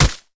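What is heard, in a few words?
wooden box, enemy sound impact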